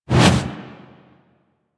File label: Generic Weapon Aim Transition In; Warframe; Weapons; Archwing